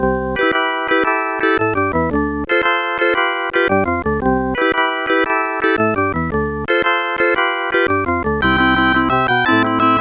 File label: ballad song; The Music Studio